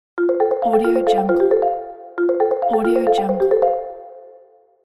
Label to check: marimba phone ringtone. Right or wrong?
Right